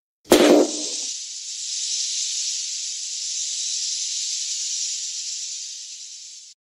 tiếng bắn pháo hoa giấy kim tuyến

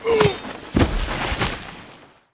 Tieng nguoi dan ong bi trung dao nga xuong dat

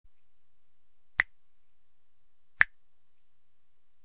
Tiếng búng ngón tay

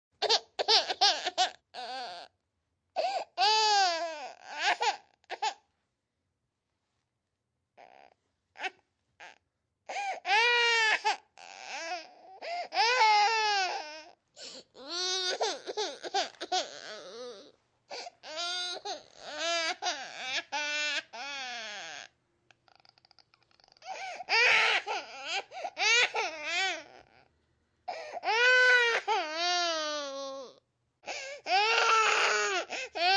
Tieng tre so sinh khoc doi bu

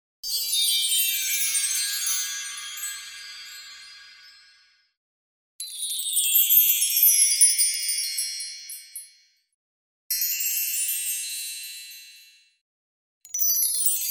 Âm thanh chuyển tiếp tiếng chuông gió

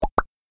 Âm thanh like facebook

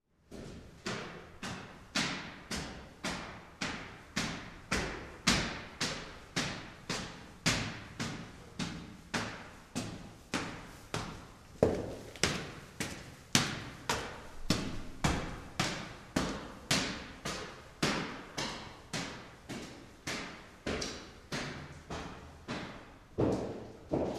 Tiếng bước chân lên xuống cầu thang lép xép

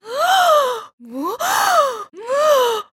Am thanh bat ngo hoang hot giong nu

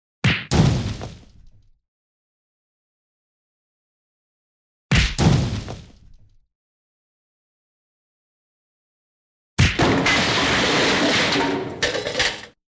tieng do vat roi xuong sau cu dam manh

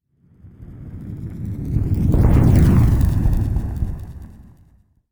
Tieng lua chay vut qua

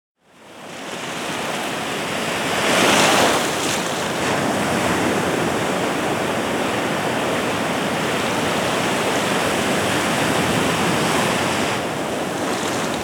Am thanh gio bien va song mua he

